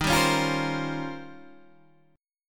D sharp 13th, Guitar, DADGAD

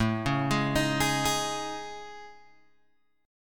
A Major 7th Flat 5th, Guitar Open G; chord

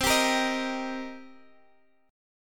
D sharp 6th, C

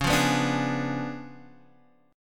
C sharp 7th Flat 9th Guitar Open G, chord

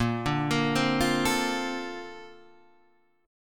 Bb Major 9th, Guitar, Half Step Down; arpeggiated; JGuitar

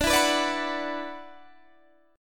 F sharp 7th Suspended 4th Sharp 5th D

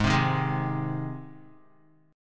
Ab Major Ab down Guitar; strummed; JGuitar

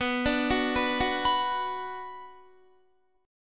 B Minor, Guitar; arpeggiated

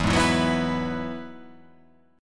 E Major Add 9th

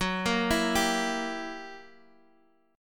F sharp 7th Flat 9th F sharp down Guitar Drop D

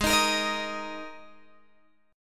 Ab Suspended 2nd Guitar Open G

